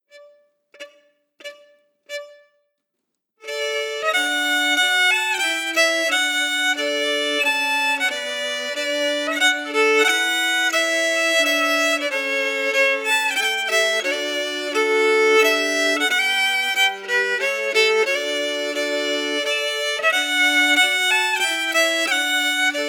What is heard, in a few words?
Lochanside melody emphasized